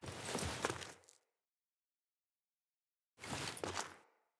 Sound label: Foley Action Default Activate Door; Player